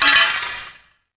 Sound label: Scaffolding Collision